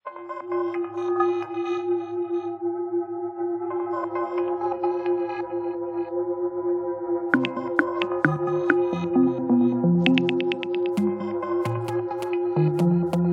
Zip Laser Toe Tap, Windows Mobile ringtones; Microsoft; Individual download-only (2009)

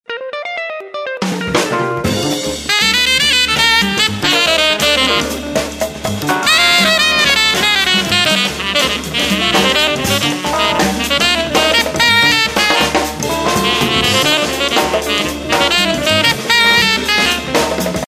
Jazz bar; Samsung GT-S5500H

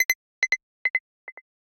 NFC Transfer Initiated; Nothing Phone (2)

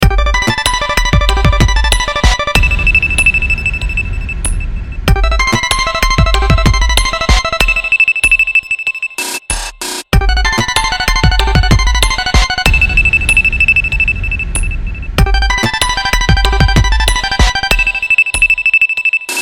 Cello Suite No.1; LG; Magic Hour

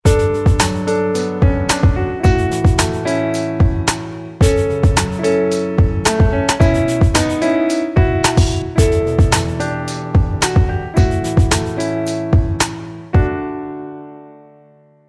Beat; Bluboo; Xfire-5.1; alarms

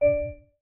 Class Harp, notifications; Oppo Find X5

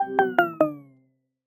Low Battery; Google; Android-16-Beta

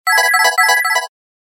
Serene tone

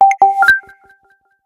Message default; VEGA; SKY IM-100

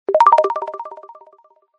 Bubble, notifications; HTC Desire

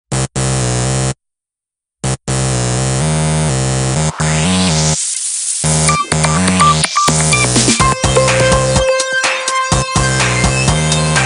Zee beam; Nokia; 7070 Prism

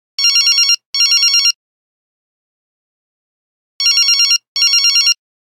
Ring ring, Nokia Buzzer; Windows Phone apps

